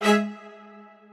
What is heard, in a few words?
strings